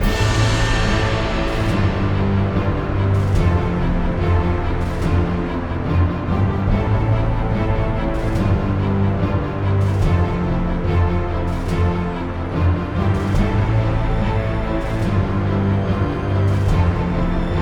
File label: Arranged Instrumental; Pony Music Archive